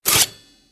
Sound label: Pop Up Spikes; Freedom Fall